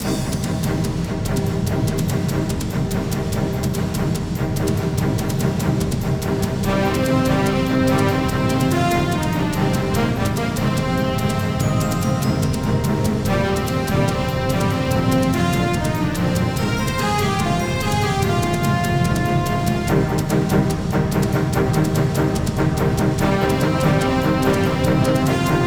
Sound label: music action big; TyTheTasmanianTiger3; XBOX